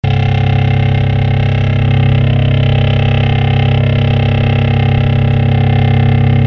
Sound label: noise, scanners; microtekv6usl